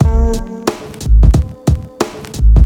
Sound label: hiphop drumloop snare snapper extreme